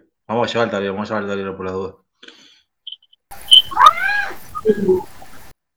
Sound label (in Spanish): Grito; Hotel Eden; Ampli